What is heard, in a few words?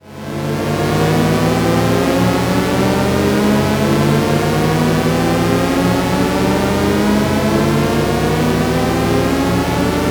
Shepard mid Saw Rev, shepard tone; experimental